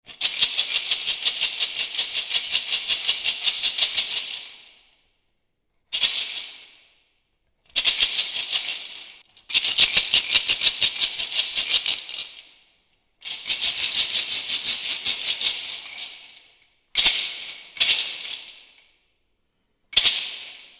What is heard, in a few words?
Shaker Rattles, Various Shakes; Musical; Tom Hutchings